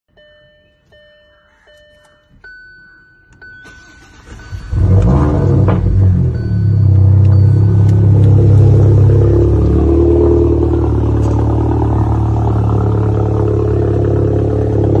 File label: Kia Sonet; ماشین